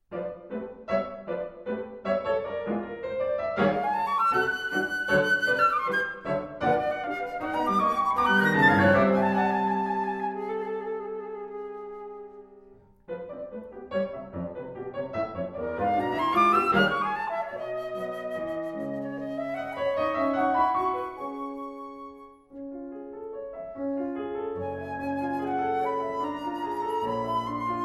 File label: Sonata for Flute and Piano